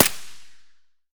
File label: ak74 silencer distant, weapons; STALKER; IWP